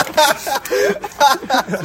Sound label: laugh